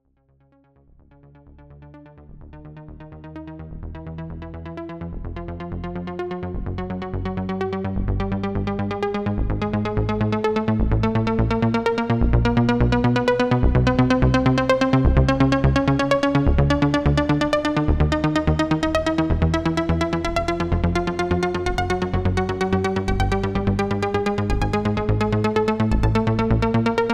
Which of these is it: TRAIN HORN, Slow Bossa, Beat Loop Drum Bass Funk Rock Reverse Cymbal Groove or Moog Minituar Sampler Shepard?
Moog Minituar Sampler Shepard